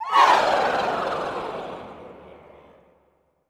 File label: CHR FALL, Classical Choir; Best Service; Extended Classical Choir; FALLS